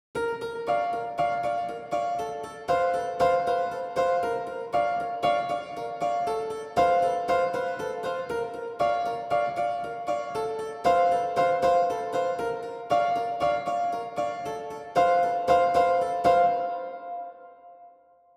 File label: Intro piano recreation